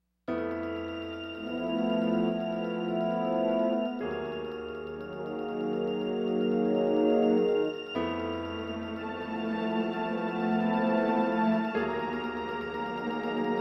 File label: Suspense into latin